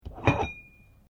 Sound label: Weights Dumbell Pick Up, Gym weight lifting equipment; Sports